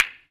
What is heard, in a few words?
billiard ball